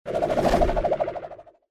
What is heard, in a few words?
Clean; RandomGFGoStop